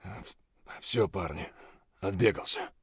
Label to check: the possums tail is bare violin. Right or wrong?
Wrong